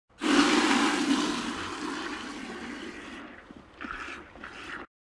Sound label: Animal effects snorts grunts farm ambience